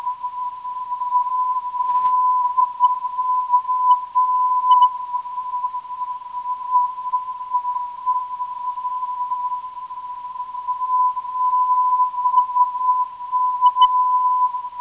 1083kHz, ndb

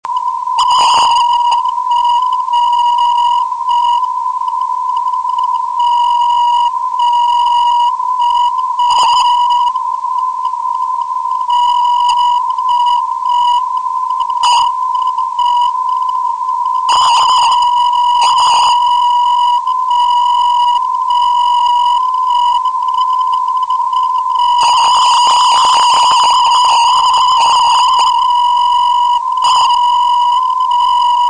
478.4khz

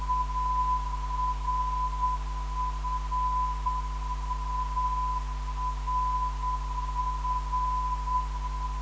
383.5khz, ndb

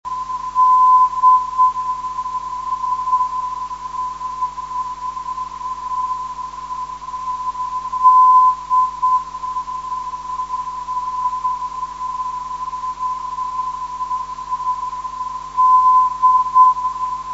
d 373khz, ndb

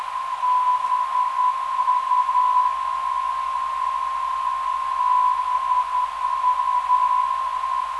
515kHz, ndb

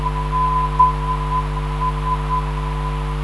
375kHz, ndb

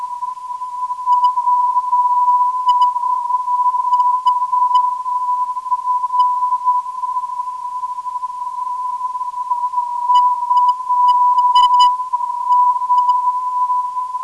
490kHz, ndb

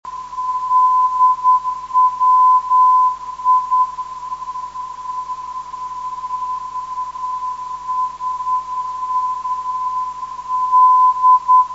357 kHz, ndb

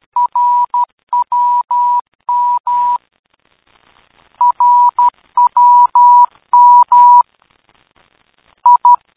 rwm; Time

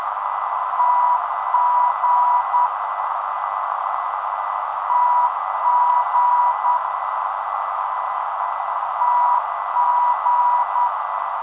478.9kHz

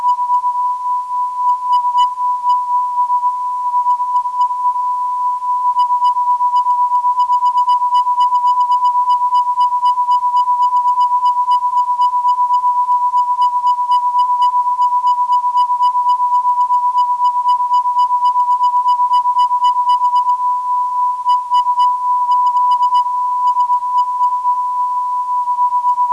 427kHz, ndb

